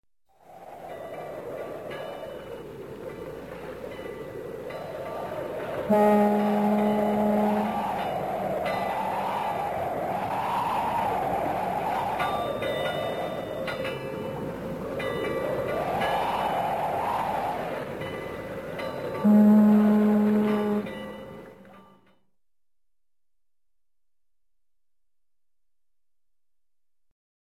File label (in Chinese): 9种风声音